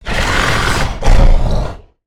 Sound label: Pain Heavy Breaths; Evolve; Goliath